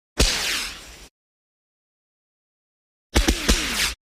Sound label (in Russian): odinochnij i trojnoj vistrel fejerverka bez vzriva